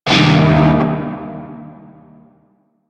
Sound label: Shawzin Pent Min Chord D; Music; Warframe; Narmer Shawzin